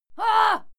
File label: female fight scream hah; schreie; menschen